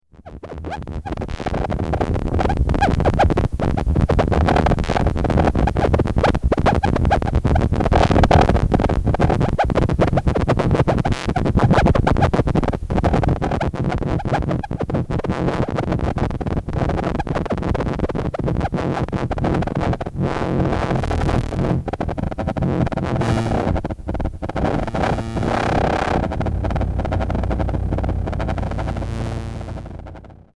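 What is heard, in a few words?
stereo delta modulation and source; stereo delta modlation and source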